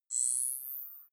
zoom in light; signale

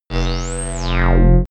intruder alarm; energie

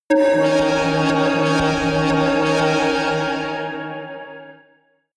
synth hit tube high, Hits/Impacts; whooshes rises

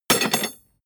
metal tools drop; soundpool

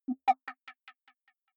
button echo wobble bright; signale

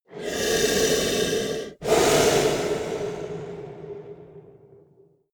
monster snore breath single; koerpergeraeusche; comedy-cartoon; soundpool

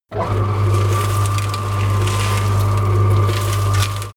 cement mixer, baustelle; atmosphaeren umwelt

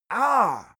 male hit soft; menschen; schreie

